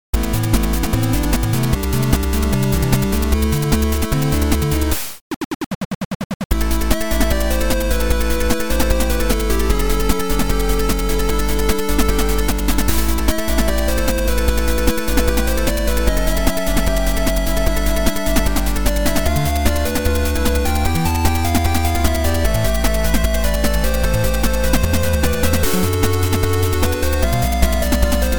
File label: Upbeat Remix